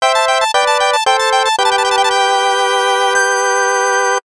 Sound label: Email Chime; Paper Mario - The Thousand-Year Door (GC DSP)